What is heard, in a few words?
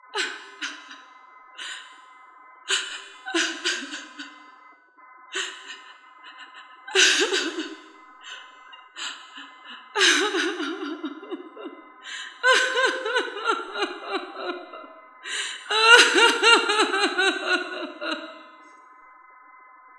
crying